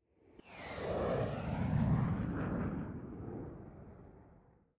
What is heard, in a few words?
Sound Of Sonic Wave